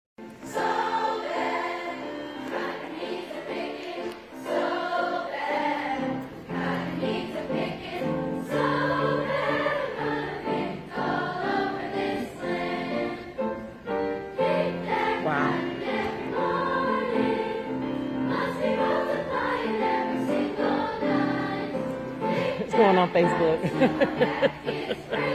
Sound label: choir; Springfield